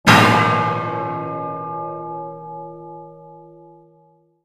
Head Hit W bell Ring

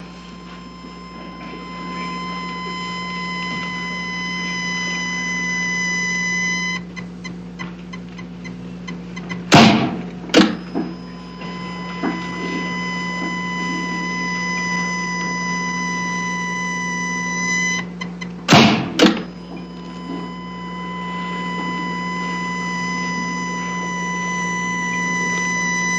Defibrillator Medical Heart Machine; Heartbeats & EKG

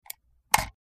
Highpitched Mouse, mouse

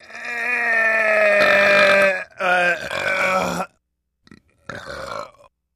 Belch Lng Obnoxiou

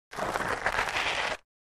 Object Dragged Acros, rocks & dirt

